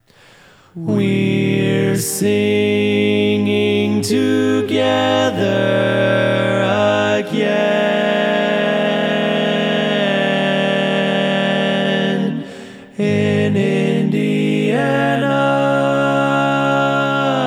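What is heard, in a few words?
Baritone, barbershop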